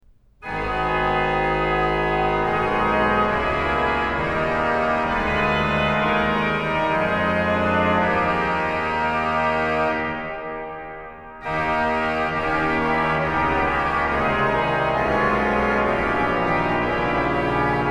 Ich hab mein Sach Gott heimgestellt, organ; BWV0708; Kirnberger; BACH; BACH ORGAN WORKS; CHORALE PRELUDES

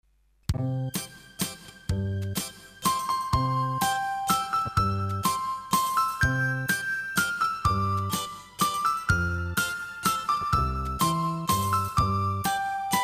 Instrumental, karaoke, Bulgarian; Bulgarian Folk Songs; Children

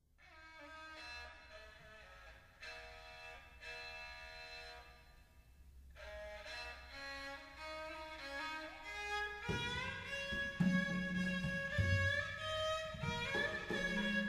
Javanese Gamelan; Sampak Manyura; Indonesian

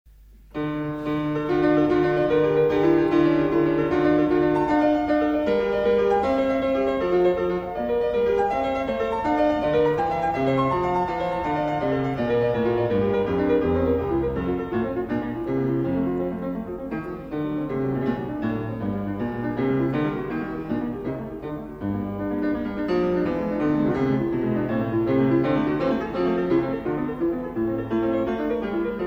Prelude in D minor, Clavier; Prelude and Fugue in D minor, BWV 851; Bach; The Well-Tempered Clavier; Book 1

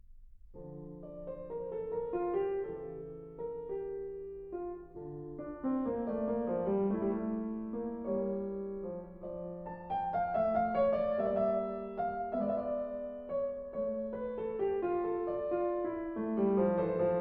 Prelude In D Minor; BWV 940; BACH; COMPLETE SOLO CLAVIER WORKS; TOCCATAS PRELUDES FANTASIAS

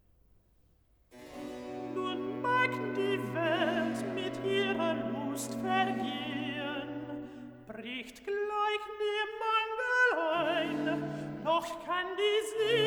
Recitativo (Alt), BACH for ALTO; Nun mag die Welt mit iher Lust vergehen

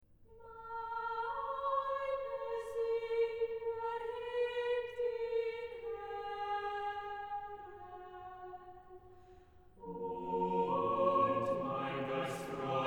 Chorale, organ; BWV0324; Meine Seele erhebet den Herren; BACH; CHORALE PRELUDES

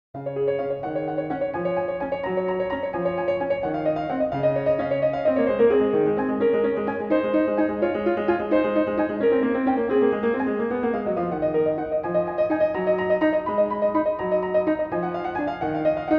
Prelude in C-sharp Major; BWV 848; BACH; WELL-TEMPERED CLAVIER

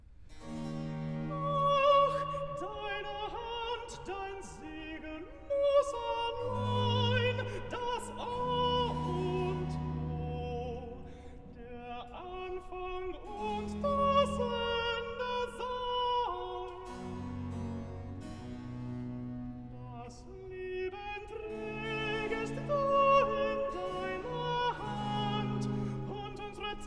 Recitative Alto; vocal; BWV041; Ach! deine Hand dein Segen muss allein; BACH

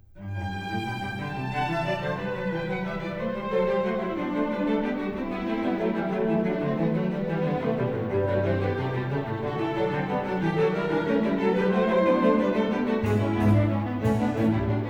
Variation 6 Canone alla Seconda, strings; Bach; Goldberg Variations; Sitkovetsky; NES Orch

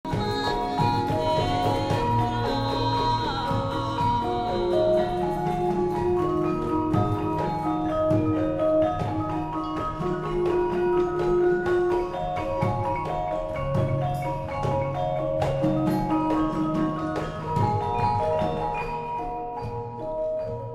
Javanese Gamelan; Ayak-Ayakan; Indonesian

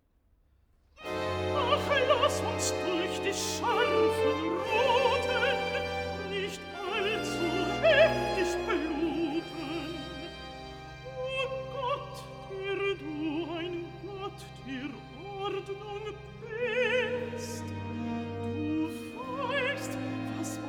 Recitativo (Alt); BWV 116; Ach, lass uns durch die scharfen Ruten; Du Friedefurst, Herr Jesu Christ; BACH CANTATAS

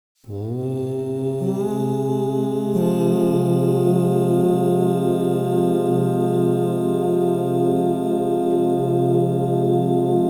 Fa minor, crystal bowls; Yoga and sound

